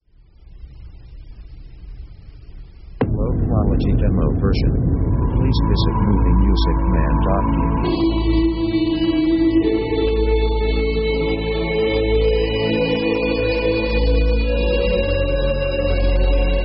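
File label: orchestra synth guitar choir; synth themes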